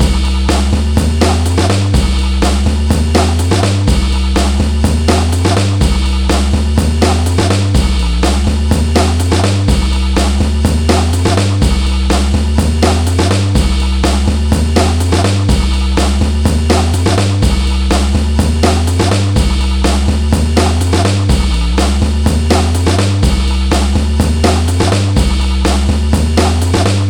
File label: TRAILER BREAK 124 bpm; DANGEROUS TRAILER BREAK; 90 s Sample CDs; Planet of the Breaks